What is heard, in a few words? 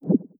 pause back click; Crimson Stella